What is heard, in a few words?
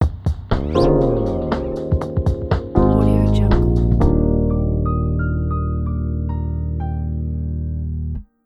soft meaningful logo ident